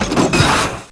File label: Ballista Shoot Sound; War Machine